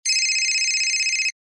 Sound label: Digital Phone; TCL; 10 SE; ringtones